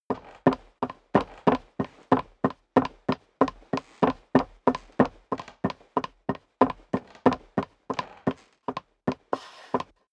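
step dress boots wood creak walk sequence, footsteps; schritte; menschen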